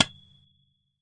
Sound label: Fracture Rim; ModeAudio Found Percussion Hits